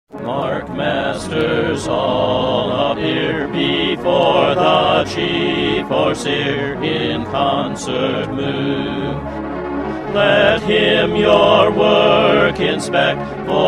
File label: Hymn w Music